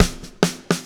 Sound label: LA SHUFF